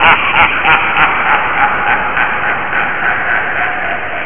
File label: sinister laugh